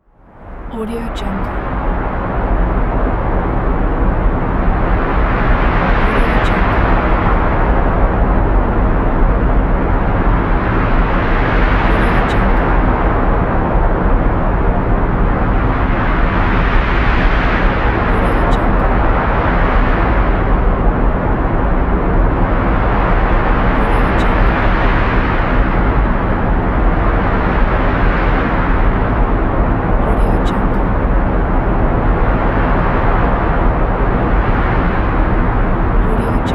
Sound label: heavy wind